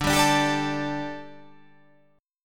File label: D Suspended 4th D